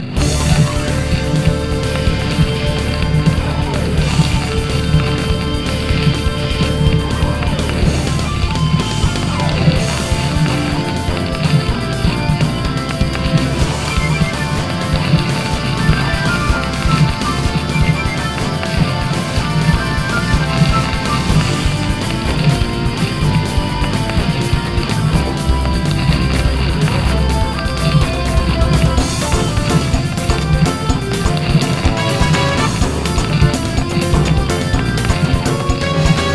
Amb Jukebox

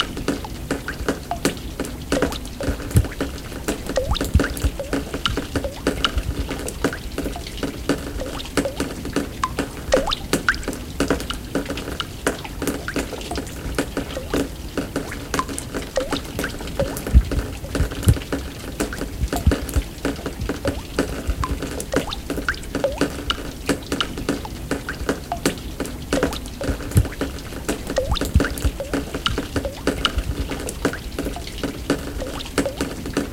Drips Ambience

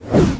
WHOOSH Air Slow Mono

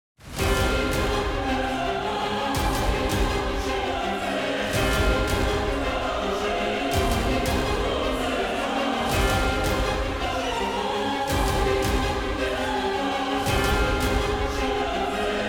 Music Phase 1 Part 3; Azrael

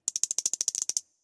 Crab RUN

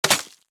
Arrow; Pecheneg

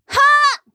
Fight Yell

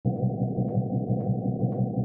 Walk

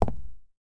Footsteps Concrete Shoes; starbites

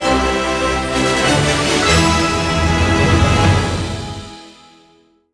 UI battle victory

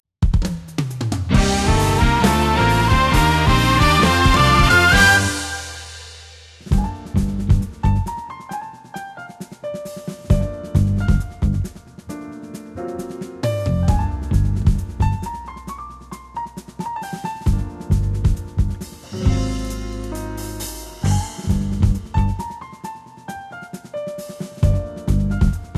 Victory Jingle 134 4-4; battle suit aces; Typhoons

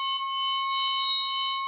Tuning Fork; gamerip

